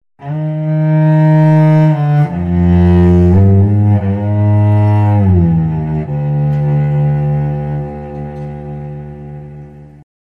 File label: Cello Whale Song